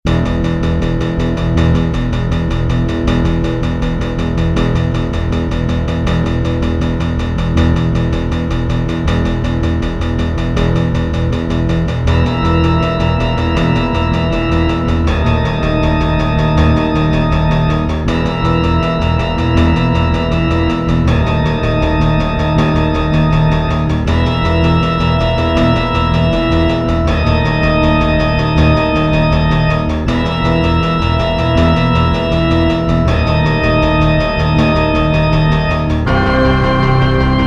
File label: Gothic Castle Medium; serious sam siberian mayhem 2022 pc gamerip; Easter Egg